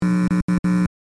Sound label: Electrical buzzing burst speaker glitch short